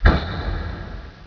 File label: echo, cave